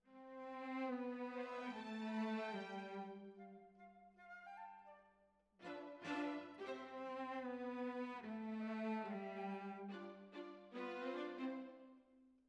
Cellos Over

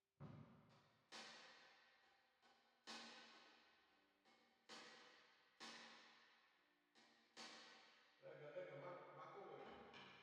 Drums Verb

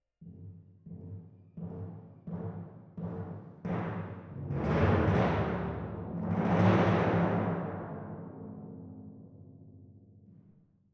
Timp

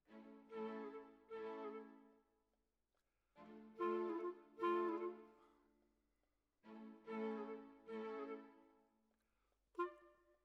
Clarinet

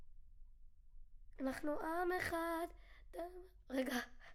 Children Choir; Studio Bekol Children Choir

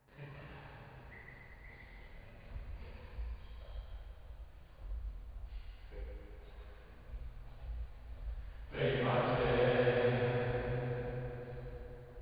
Choir

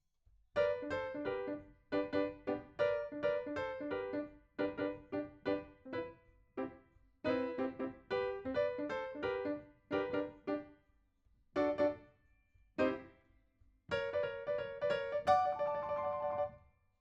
PIANO, RNR for piano

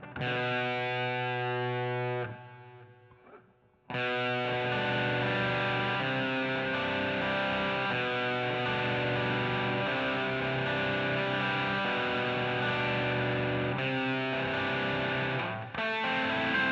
GTR A Solo

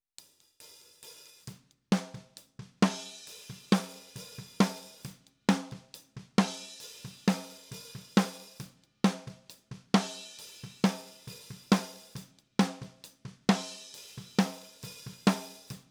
Snare Top

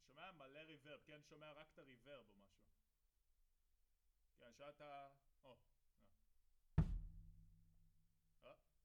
Kit

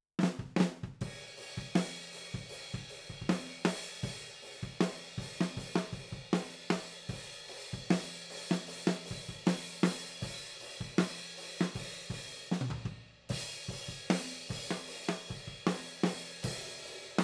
OH A

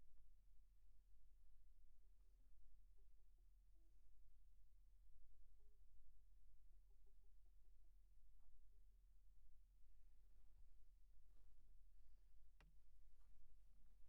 Sax

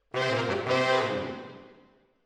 MKH AB, piano

